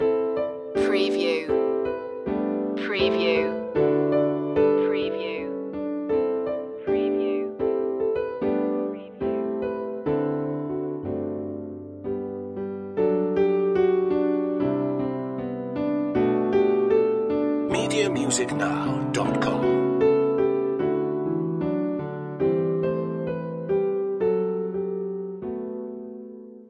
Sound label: pastorale loop